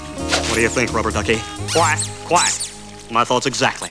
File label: rubber ducky